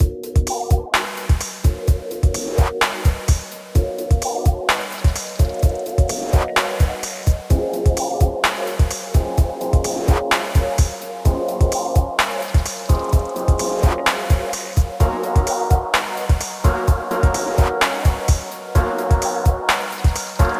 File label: Lounge